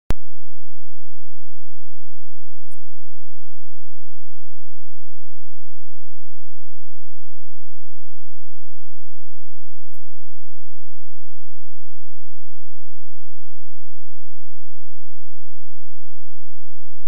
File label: Brain Fog Rife Frequencies